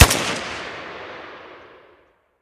draco fire; Guns

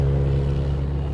engine, Vehicles

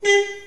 horn, Vehicles

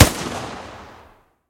hk416 fire, Guns

